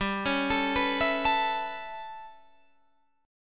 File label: A 9th G, Guitar Standard